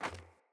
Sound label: Sandals Run Metal, Footsteps; Player